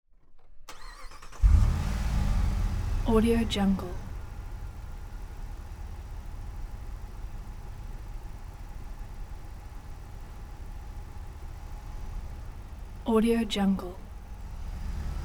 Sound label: 2005 dodge ram 1500 pickup truck start idle